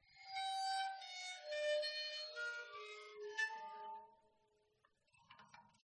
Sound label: waterphone long bows dynamic; aston stealth